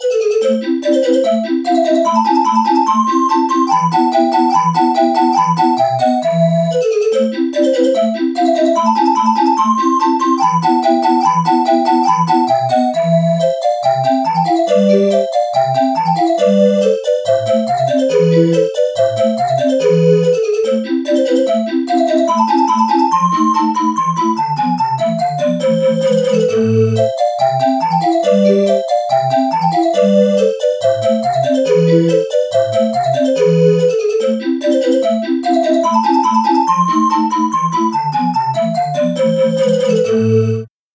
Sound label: bottle chiff samples